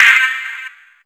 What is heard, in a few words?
STAB